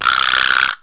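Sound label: cartoon shake; Wired for Sound Pro CD; Aristosoft, Inc.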